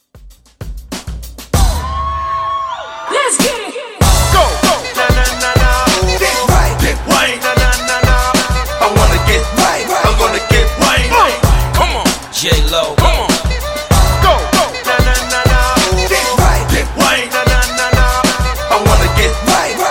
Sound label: Funk Breakz In Out 97 Bpm